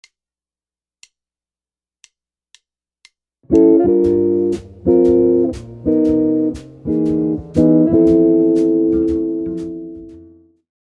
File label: Chord Licks, jazz guitar